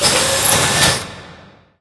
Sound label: motel wall collapse; Source Engine; Portal 2